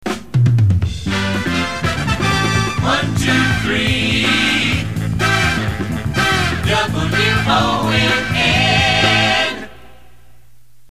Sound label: jingle; WONN; 123 wonn